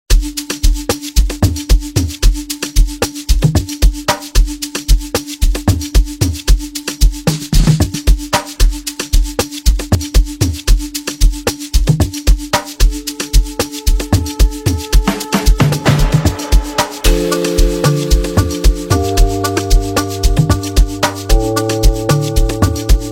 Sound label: Instrumental